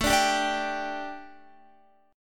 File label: A 7th Suspended 4th Guitar, chord; A7sus4 strummed